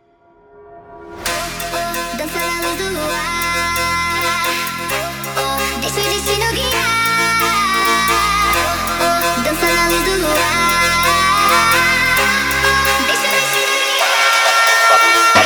Montagem sped Up

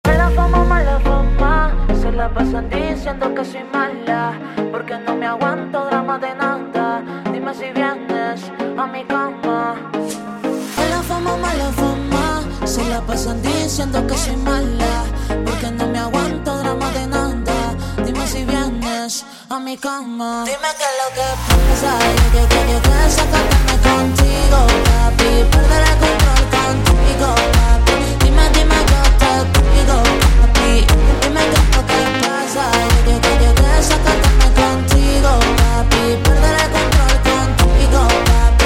Montagem super Slowed

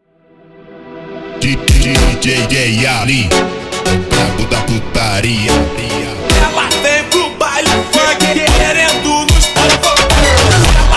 Montagem slowed

